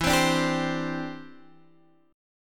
E Minor 7th Sharp 5th Guitar Drop D; chord shape

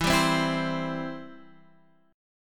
E Minor Sharp 5th E down Guitar Standard; Em#5 strummed; JGuitar

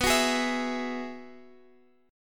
F sharp 7th Suspended 2nd Suspended 4th, B

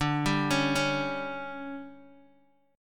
D Major 7th Suspended 4th, Guitar, Open D

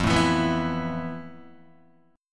F Major Sharp 11th, Guitar; strummed; JGuitar

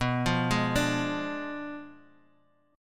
B Diminished 7th B down Guitar; Bdim7 arpeggiated; JGuitar

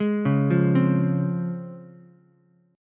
C sharp Major 7th C sharp Ukulele; C#M7 arpeggiated; 1,1,1,3; JGuitar

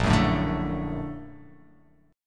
A sharp Augmented Major 7th A sharp; x,1,4,2,2,4,x,x

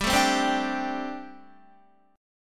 G Suspended 2nd Suspended 4th, Guitar

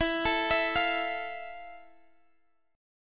F Major 7th E

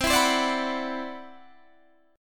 C-Major Sharp 11th, Guitar Drop D

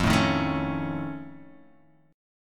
F Augmented 7th F, chord

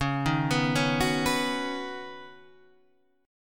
Db 7th Suspended 2nd Suspended 4th; 9,6,9,6,7,7; Db7sus2sus4 arpeggiated

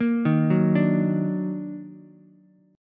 Eb Minor Major 7th Eb down Ukulele, chord; EbmM7; arpeggiated; JGuitar

